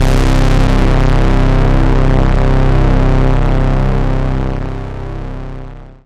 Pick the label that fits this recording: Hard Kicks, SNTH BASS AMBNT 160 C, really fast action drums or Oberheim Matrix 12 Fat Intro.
Oberheim Matrix 12 Fat Intro